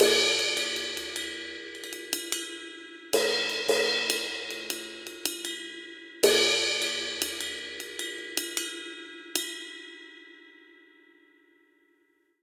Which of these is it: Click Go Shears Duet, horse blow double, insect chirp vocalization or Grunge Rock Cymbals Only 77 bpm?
Grunge Rock Cymbals Only 77 bpm